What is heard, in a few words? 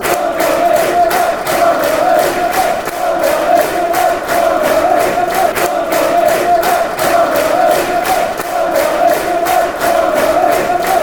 green and white barmy army, fanchants; ytfc